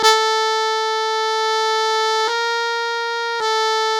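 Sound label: trumpet solo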